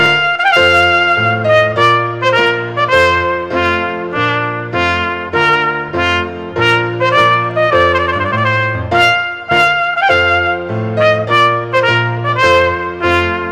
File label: Trumpet Tune; Purcell; Wedding